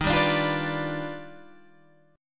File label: Eb Augmented Major 9th; strummed